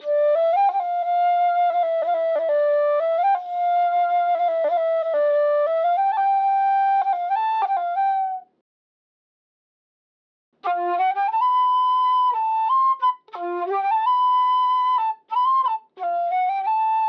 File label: Hip Hop American Western Concert Flute G maj 90 BPM